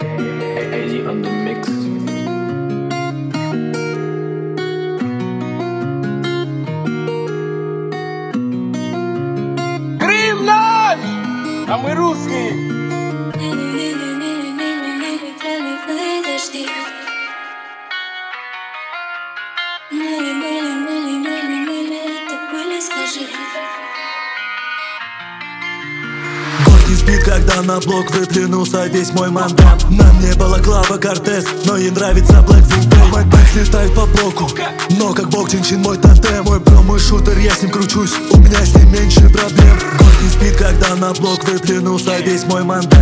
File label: Heavy Rains